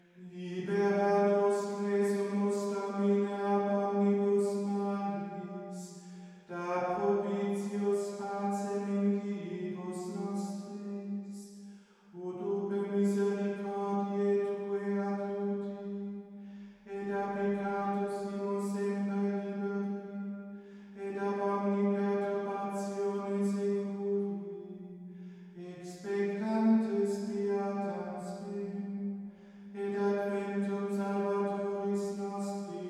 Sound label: Ordo Missae in Lingua Latina Libera nos, Chant